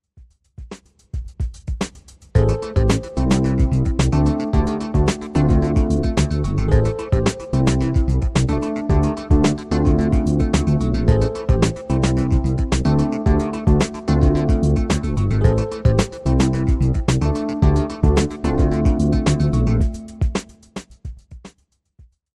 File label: funky